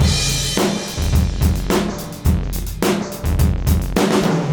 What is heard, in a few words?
fx, Beat Loops, 106; Zero-G; Monster Beats